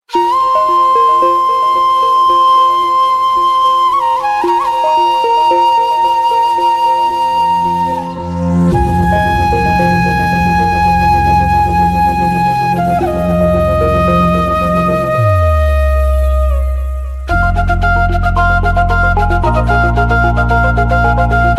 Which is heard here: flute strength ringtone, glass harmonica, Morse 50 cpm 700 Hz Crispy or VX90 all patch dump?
flute strength ringtone